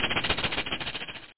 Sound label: hiss